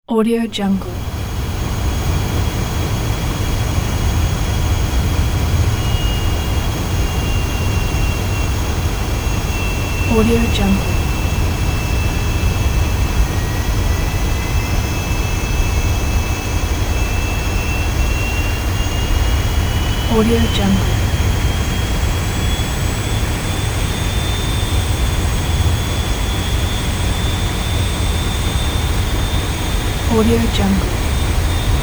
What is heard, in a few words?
passenger cruise ship engine room with turbines; sound-ideas